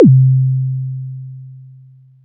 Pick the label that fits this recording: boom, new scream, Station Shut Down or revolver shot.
boom